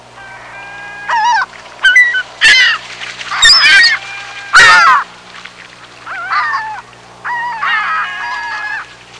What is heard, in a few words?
Beach shore sound ambience